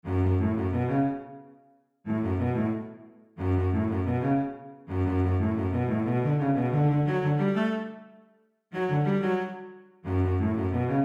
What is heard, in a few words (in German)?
Cello Konzert Satz